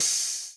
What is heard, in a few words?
Ambient Engine Steam; Among Us